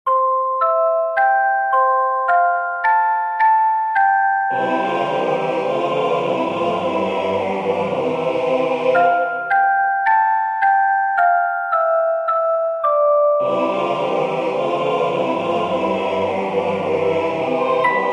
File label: for children handbells choir satb